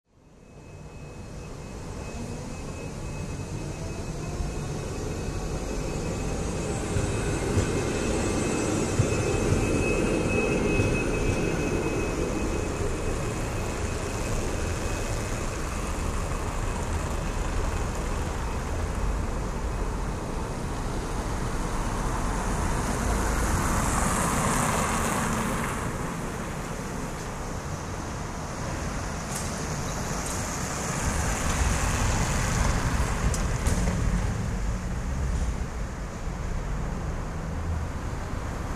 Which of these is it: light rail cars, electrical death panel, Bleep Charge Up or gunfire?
light rail cars